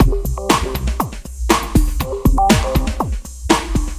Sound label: Elektro, Processed Percussion